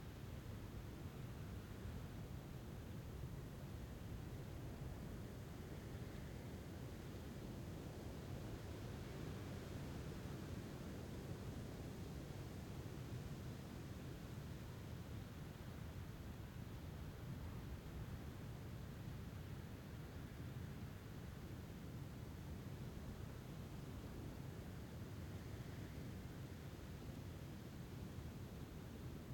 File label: Amb Wind Calm Loop; viewfinder